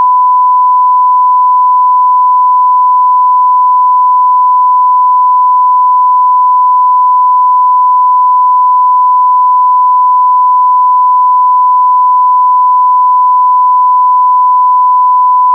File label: playback sine 1khz 48k 16bit 2ch; rockchip rk3308; AudioTest; RK356X SDK